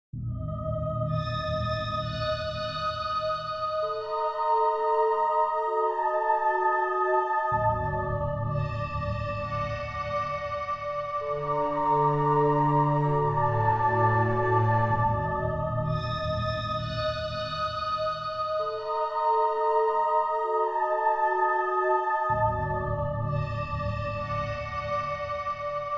Elf Forest Spooky; overlord raising hell

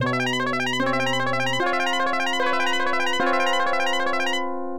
Unused Fanfare; mahjong cop ryuu shiro ookami no yabou; genesis

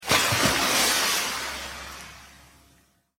Monster Attack; Granny 3; Android

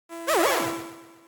pain; basebug

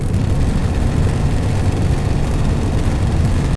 Engine Idle

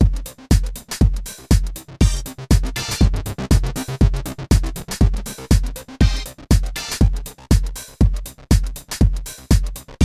Piano; Wii Play; Laser Hockey

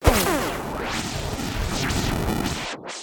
projectile; En basebug projectile; Fight Knight

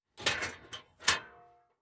Door Metalbox Open Noreverb

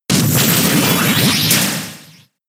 Drain Punch; Pokemon; Gen 6; XY ORAS

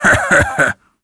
Warrior emotion laugh

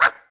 Bird Collision

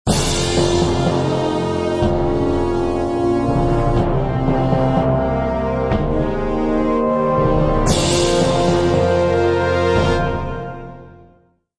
Medieval Agitated; Civilization Revolution; Xbox

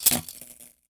Tortures Battery

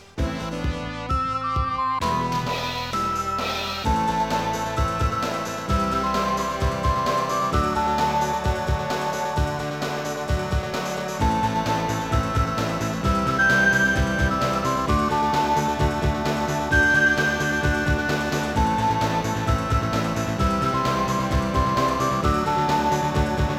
Last Boss; Maspy; Runark; Gun Frontier

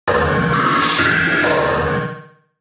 taunt; En kasket

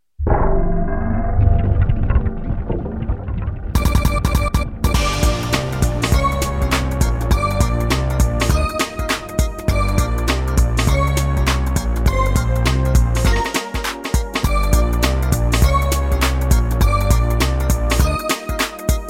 Level 5 BGM, PC Engine CD-ROM; r-type complete cd turbografx 16; Irem

